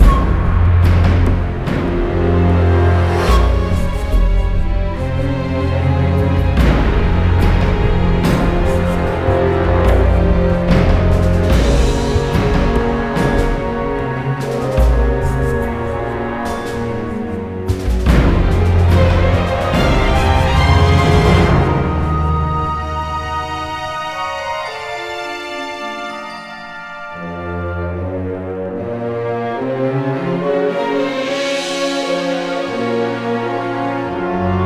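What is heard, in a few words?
Suspense loop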